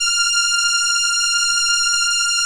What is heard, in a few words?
ELKA, String Machines; ELKA RHAP